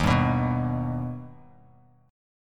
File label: D sharp Augmented D sharp Bass Standard; D#+ strummed; 11,10,9,4; JGuitar